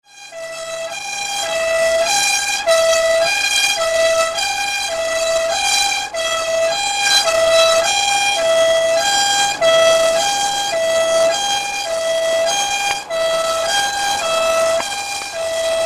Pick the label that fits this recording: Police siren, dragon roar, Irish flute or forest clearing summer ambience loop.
Police siren